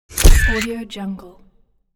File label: camera flash